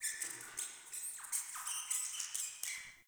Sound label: Cave Mine Rat; Ambience; Midrath Island